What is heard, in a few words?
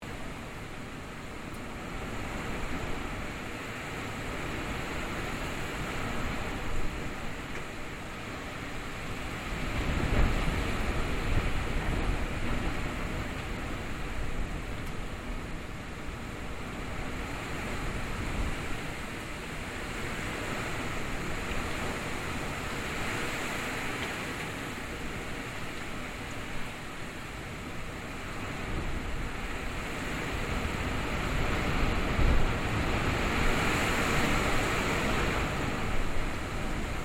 Wind storm intense loop; Weather